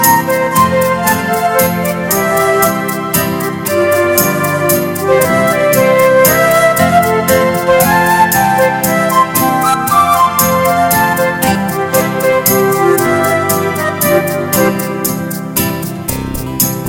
Very Pretty Serenade

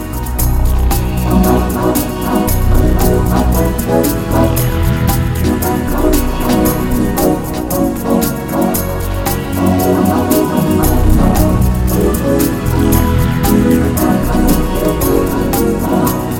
Slow Smooth Jazz

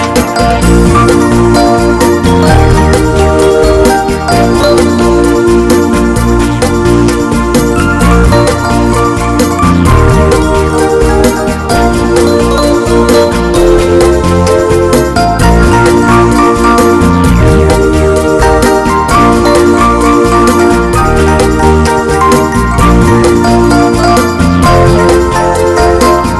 A Hot Cha Cha Remix

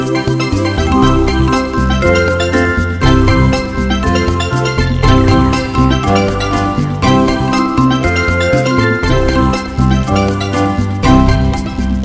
Hot Caribbean Music

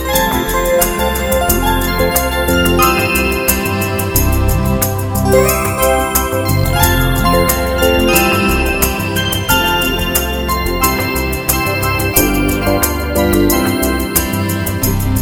Graceful and Slow

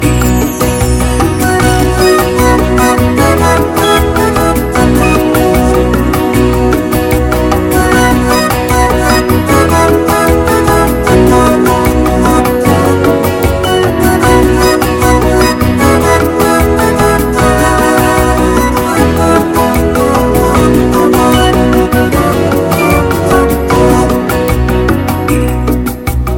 Great Pop Music

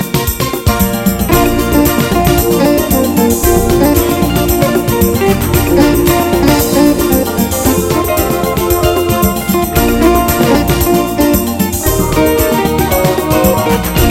Great Brazilian Music

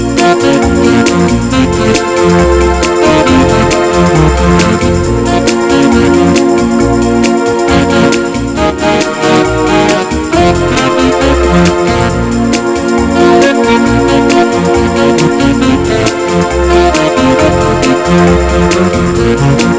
Caribbean Music

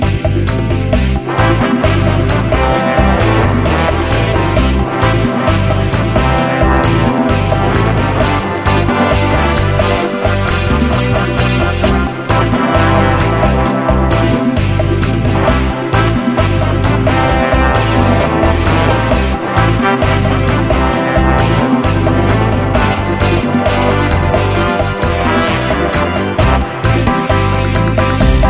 A Rock from the South

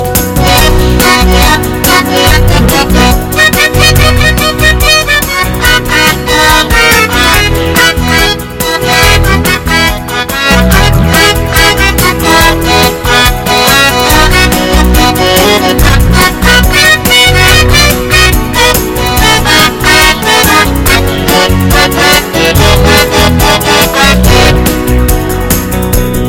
Fast and Pretty

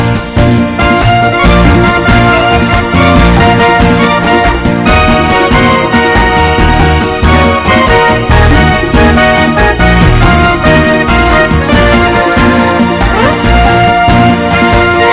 Fast and Hot

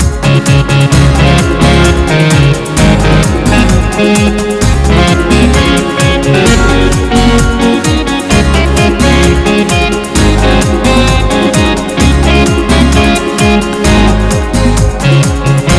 Hot Western Dancing

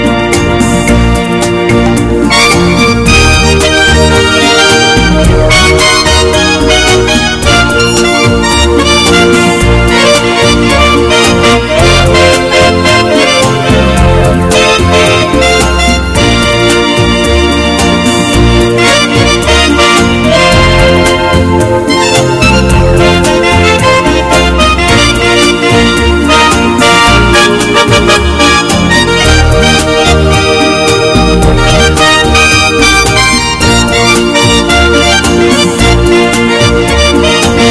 Wonderful Rhythms

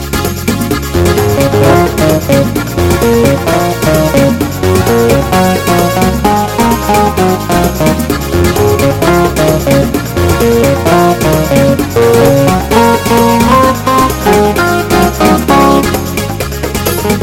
A Hot Kuduro Dance

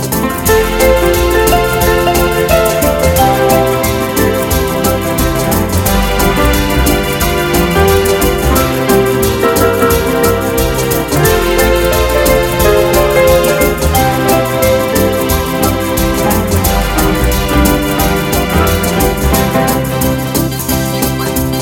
Fast and Hot Sounds

